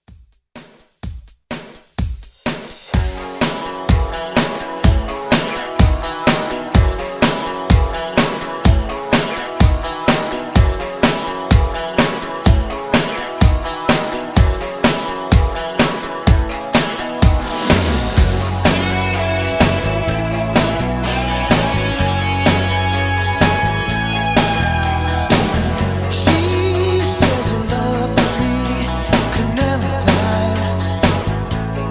80s Rock Redrum

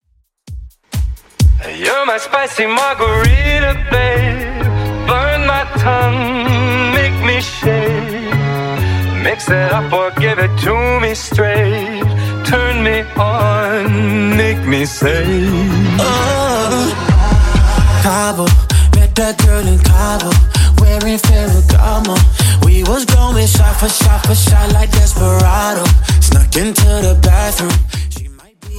Top40 Redrum 130bpm; remixplanet

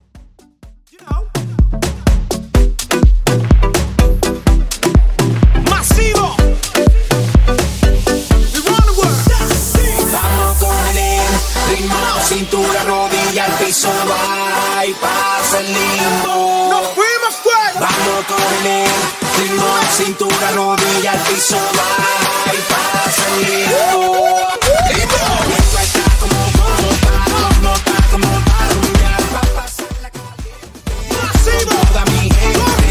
AFRO BEAT TRIBAL

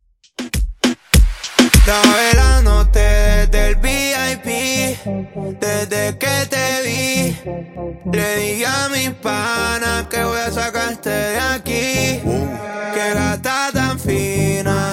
Funk Intro Outro 100Bpm

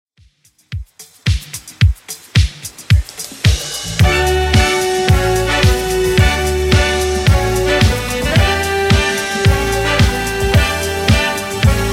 80s Redrum 110bpm

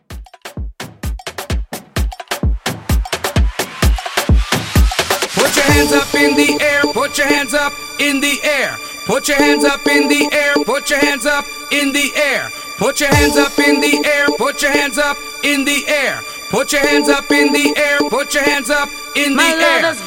Afro Mixshow 129

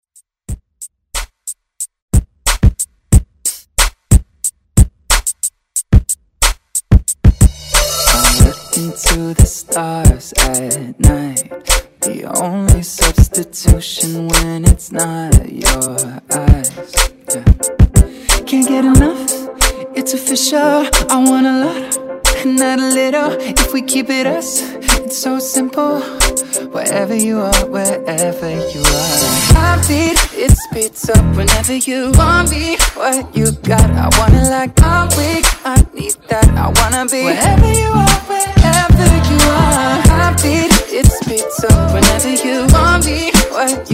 Pop Redrum

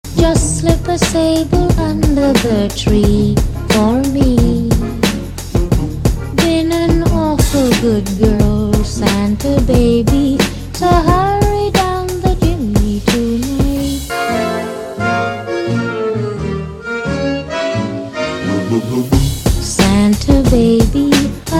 Classic Christmas; ReDrum

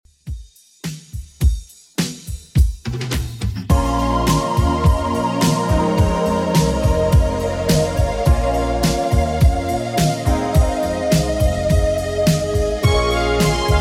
80s Redrum 105bpm

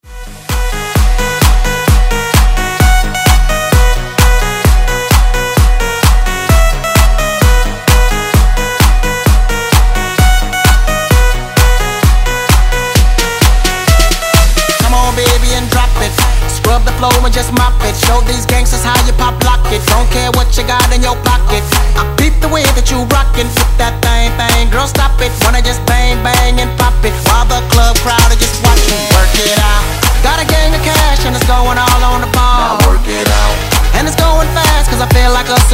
Dance

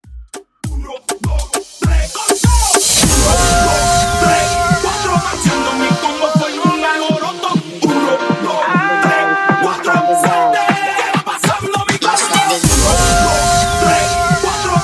Latin Moombah To Hip Hop Mashup In Outro 100 BPM

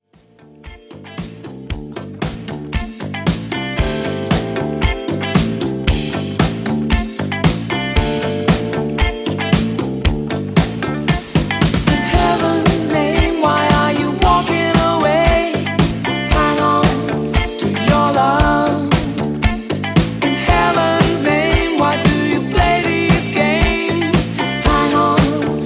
80s Smooth Jazz ReDrum 115Bpm

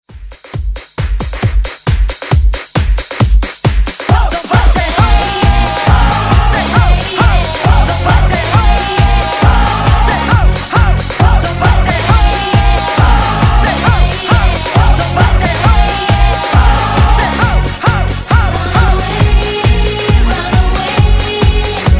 90s Dance Hype Re Drum 135 Bpm; DJDOLLS

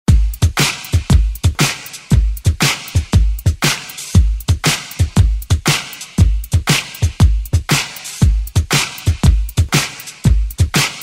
80s 118bpm

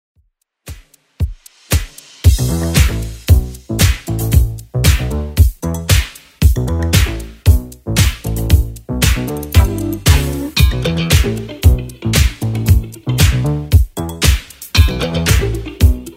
80s Redrum 115bpm

